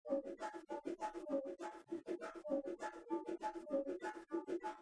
Bongo

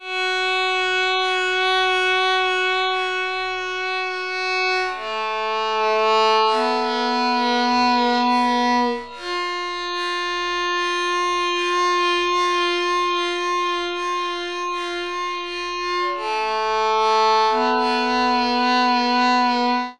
Castanets Violin

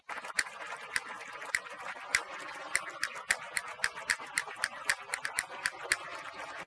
Castanets Violin Applause

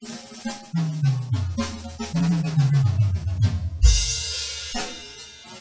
Drum Solo

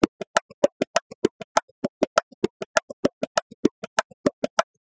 Bongo

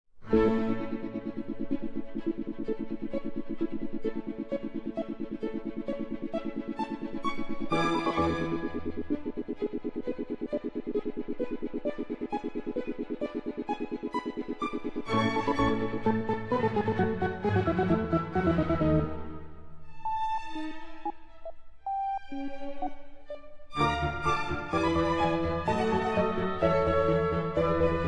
Sync Sine Soni

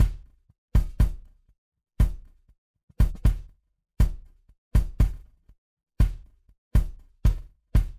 WaveDrum, KD; Decomp Mag Zero Phase TR

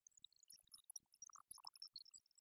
Glockenspiel

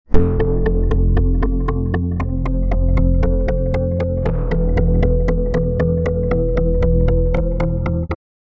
Synth Poly

